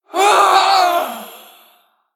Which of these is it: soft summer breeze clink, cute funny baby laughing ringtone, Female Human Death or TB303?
Female Human Death